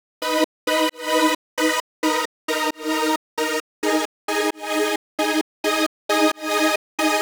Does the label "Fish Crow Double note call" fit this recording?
No